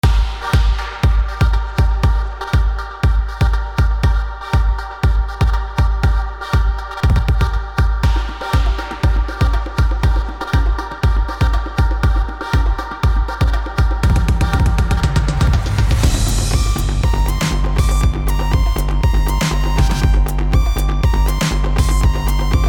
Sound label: Upbeat electronic